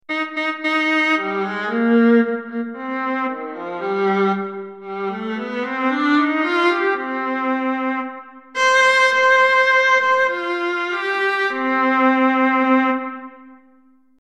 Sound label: Viola; sibelius sounds